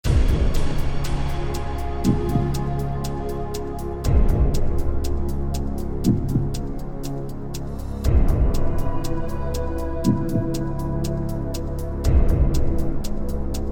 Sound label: Clock; Millionaire Soundtrack; US Clock (2008)